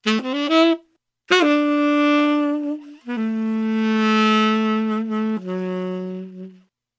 sax anger, Sad

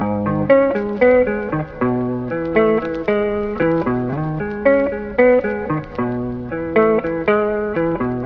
guitar hoedown, Loops, Phonky

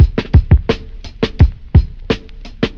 flute sauce, drum loops, funky

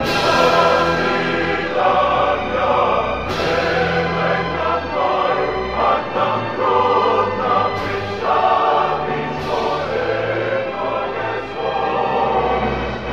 voice latin choir; Loops; Sad

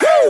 "hoo", voice; ooh ahh

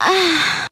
ah orgasm; ooh ahh

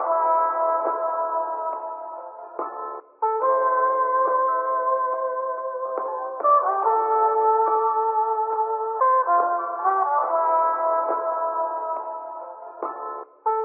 horns, phonky loops

